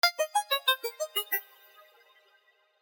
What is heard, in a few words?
synth phrase; nacre